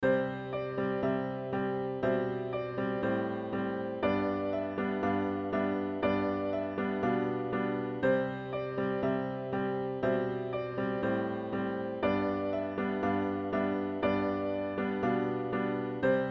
really easy and short four hand piano exercise; Casagrande Nicola

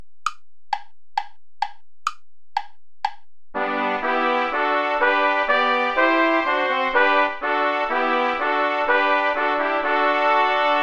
play along without trombone; schutz heinrich wohl denen die wandeln

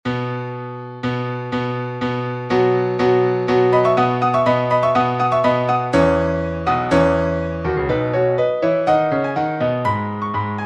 transcribed for piano; mendelssohn bartholdy felix; dance clowns

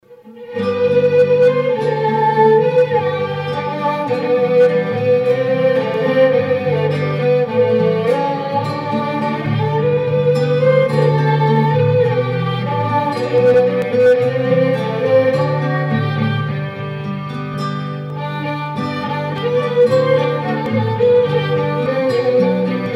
tune irish fiddle

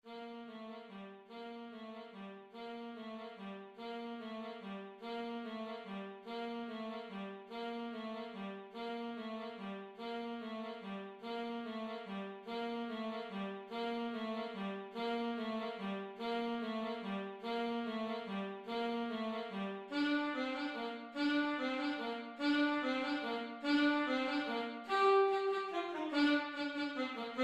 carols of the bells; leontovich mykola

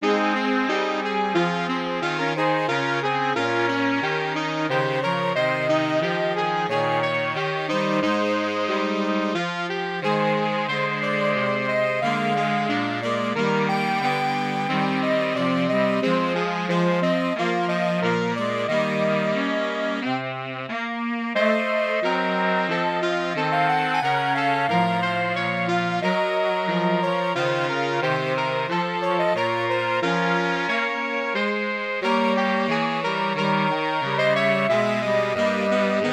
for saxophone quartet; boellmann leon; les heures mystiques

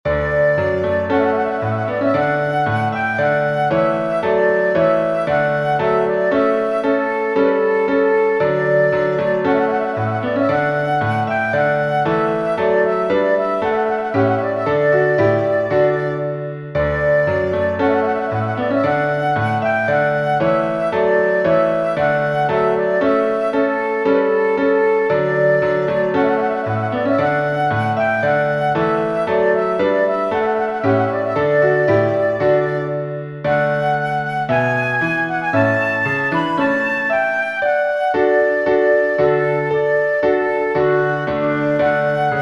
march for flute piano; clarke jeremiah; prince denmark

